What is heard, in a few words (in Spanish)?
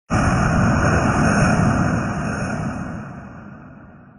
Grito de Blastoise Gigamax